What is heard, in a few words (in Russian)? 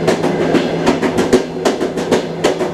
stuk, train rail; Factorio Paranoidal mod; newtrainsfx